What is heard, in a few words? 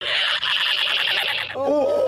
monster trill